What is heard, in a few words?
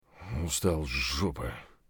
bear low hp; eft